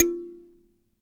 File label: Dyna Sansa, Africa